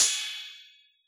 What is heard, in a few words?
analog cymbal; AnlgKit1